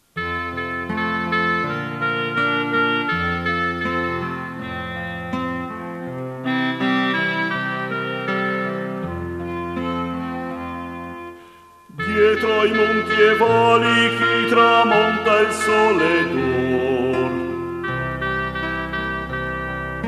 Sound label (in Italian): Campane di montenevoso